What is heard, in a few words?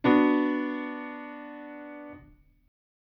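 B minor lower, chords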